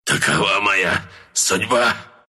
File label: death; dota2